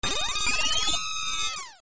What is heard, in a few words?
scan; sfx; ff3; ff3us